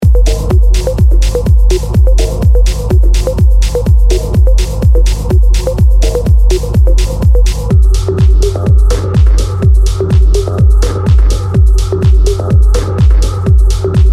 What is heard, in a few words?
techno